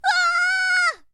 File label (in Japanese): shonen himei